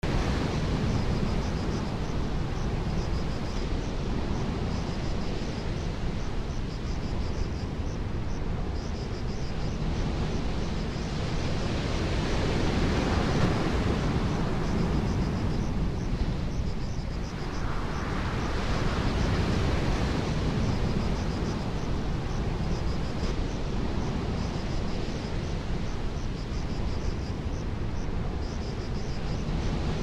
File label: wind jagged ocean cliffs